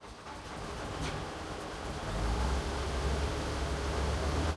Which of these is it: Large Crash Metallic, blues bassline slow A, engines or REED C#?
engines